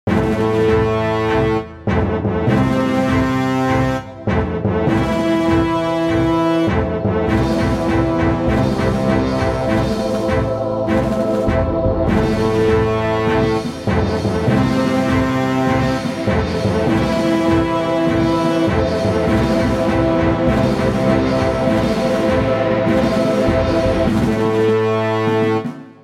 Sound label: Fanfare